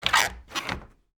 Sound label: door squeek